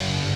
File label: Tech Guitar